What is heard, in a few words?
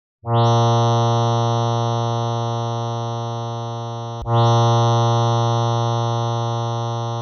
sa 233 string, tanpura; tuner